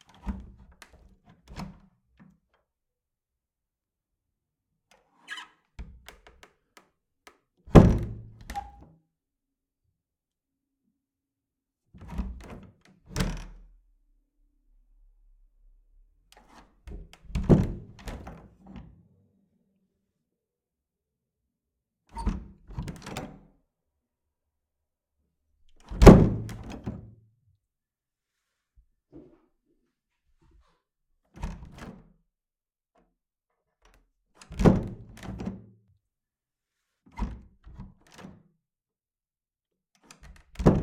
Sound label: 80s wooden door - 80s city apartment door - Opening and closing - close perspec; close perspective XY; SculpTunes; HHDoors; Household Doors and furnitures